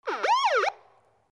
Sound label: Police